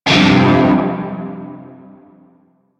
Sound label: Shawzin Pent Min Chord F; Warframe; Narmer Shawzin